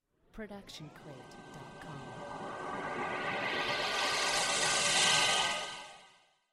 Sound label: Laser Charge Up; SoundsCrate